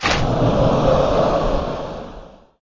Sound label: Football Recieved goal Sfx